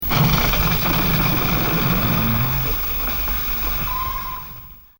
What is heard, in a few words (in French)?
son chute; MOBICLIC 84; TOUTEDIT